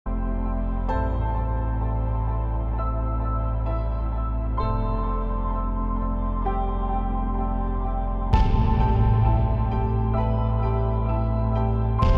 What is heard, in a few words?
ambient